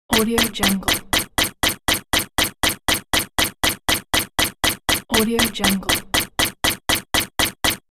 constant timer

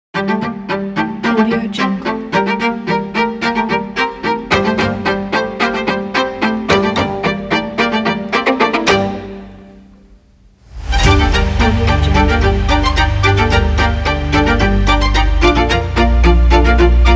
orchestral strings cinematic

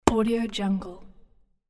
wood chopping

